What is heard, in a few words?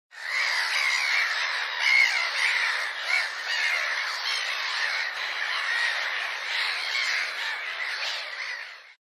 Murder of crows squaking and flying